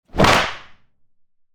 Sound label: big slap